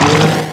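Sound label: Battle Skill; Etrian Odyssey III HD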